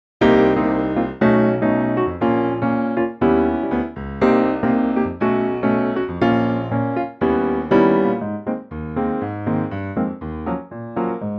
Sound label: Ab piano; key - Ab - vocal range - Ab to C#